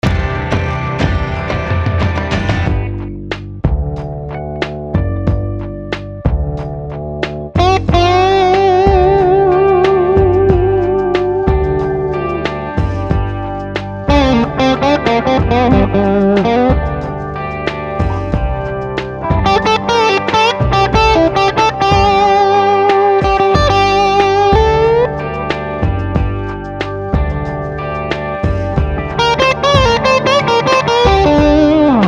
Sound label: rockinger paf